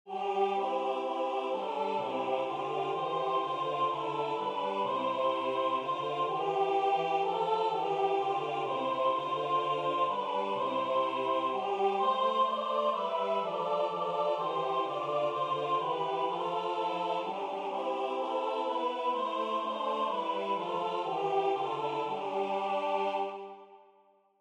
maria die soude naer bethlehem gaen SAB

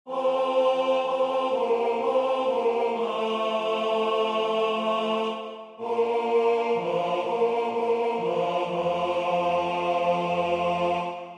Lijze rijselt die snee T

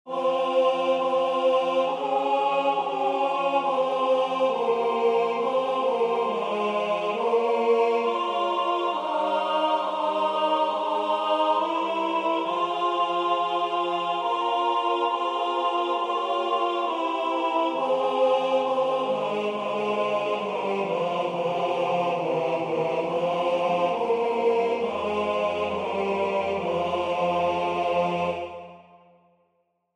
zeilen zonder wind T

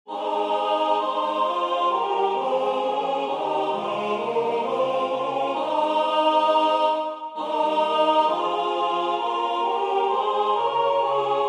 wat vrolijk over u geschreven staat A

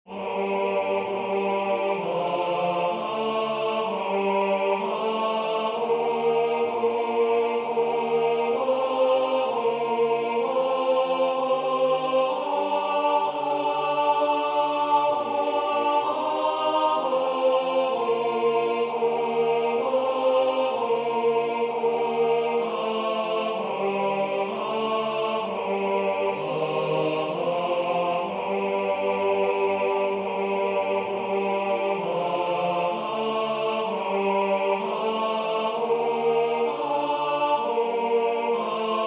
heer mijn hert T; engels; heer mijn hert is boos en schuldig

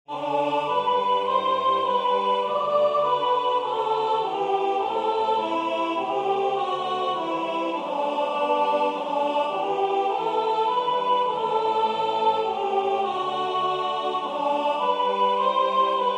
De dag door uwe gunst ontvangen S; scholefield

